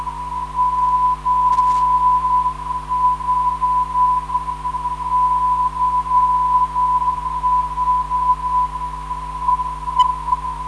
525kHz, ndb

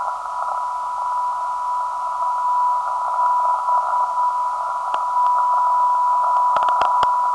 323kHz, ndb

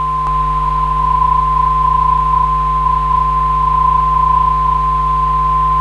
363kHz, ndb; CIG

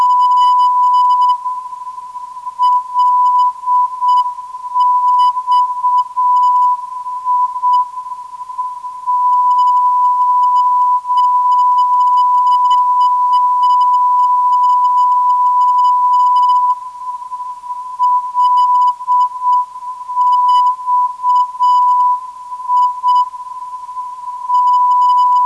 LXI 363.5kHz

